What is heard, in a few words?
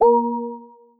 button